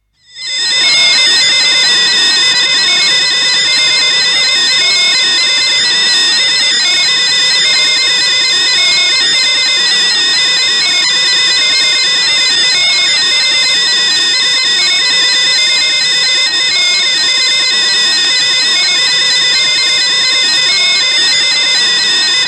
Alouette Satellite, telemetry effects from satellite; Communication Sound Effects; BBC